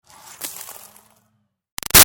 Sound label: Snow Dust Drop Icy Tinkle; Weather